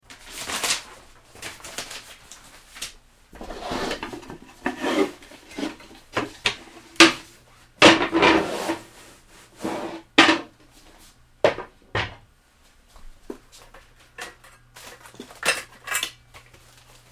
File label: Activity Backstage Rummaging Various, theater; Various Paper, Cables, Drawers; Theaters